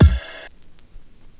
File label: kick; eddyflux; crate bd; dough-samples